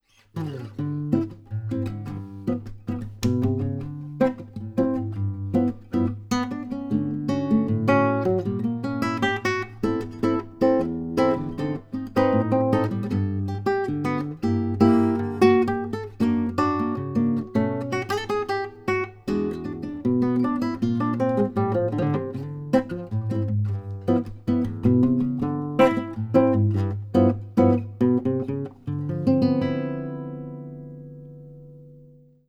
wave

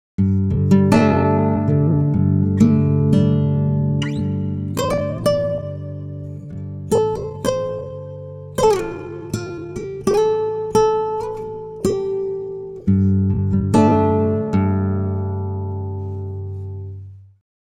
pitchshift guitar; ikm sample tank stretch

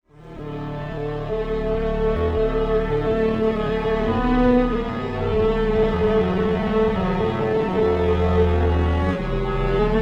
strings; timestretch

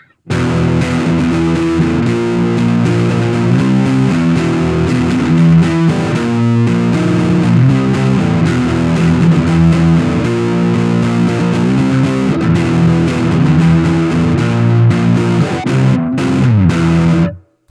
metal

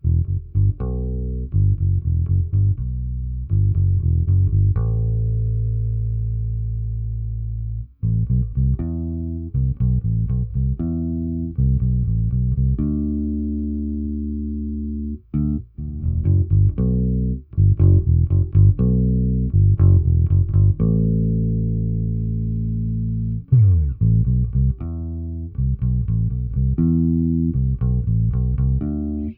bass; crowley and tripp; el diablo; bass doigt; re20

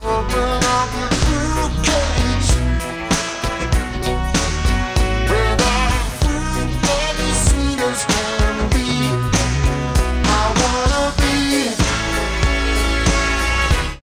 Celemony Melodyne pitch shift

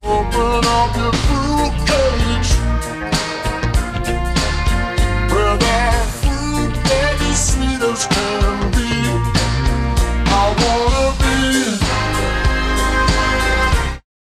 pitch shift -5

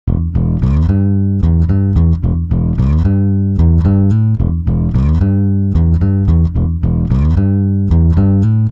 bass; serato pitch n time pro; timestretch

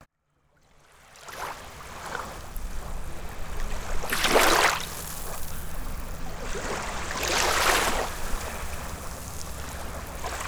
beach; olympus ls-10

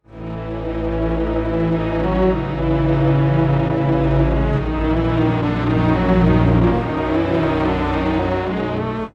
strings, pitchshift; prosoniq time factory clear scale

